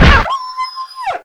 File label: Dog; fun and bullets